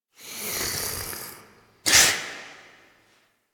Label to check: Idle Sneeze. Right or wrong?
Right